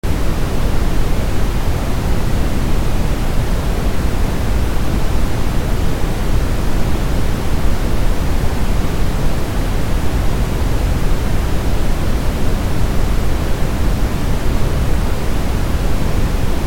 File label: brown noise